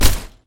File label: Suppressor